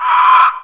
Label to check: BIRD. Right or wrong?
Right